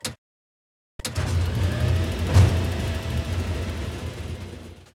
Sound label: gun reload; World of Tanks